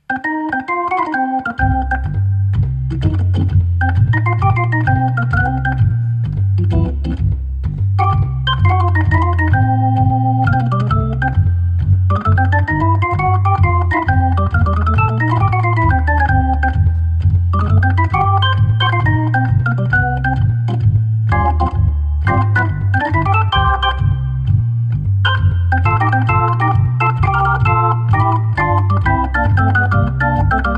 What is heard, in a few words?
Jazz Blues, Hammond organ